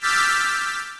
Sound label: spellcast